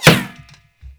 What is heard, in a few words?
plastic hollow bullet impact; Rust